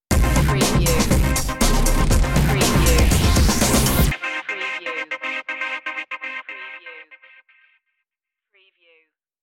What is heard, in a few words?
virus stinger